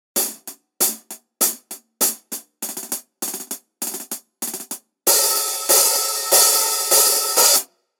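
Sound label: Resonant Hihat 15, cymbal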